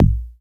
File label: Tom; Ableton; Foundation Drums; FMD HandyTom